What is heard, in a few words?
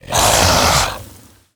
Ravaging Ghoul Attack